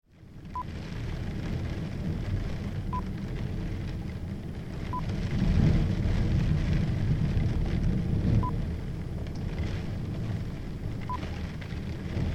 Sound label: Storm wind rain Interior Window